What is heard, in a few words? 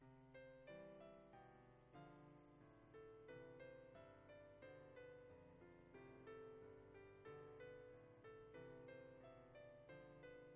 Piano Ready For Loop